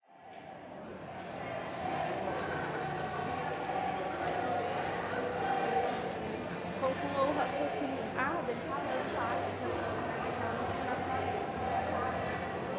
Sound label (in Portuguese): Pessoas Protestando na Universidade de Brasilia Cantando Gritos de Protest; multidao